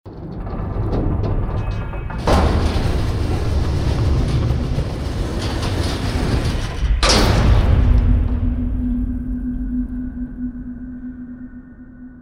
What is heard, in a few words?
Canyon Ancestor Gate Open; Journey